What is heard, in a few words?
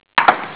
drop, pool; game